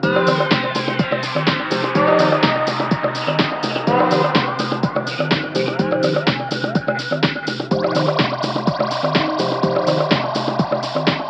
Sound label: Boom Box Track loop lo